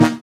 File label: SYN, dance; Sound & Vision - Gigapack II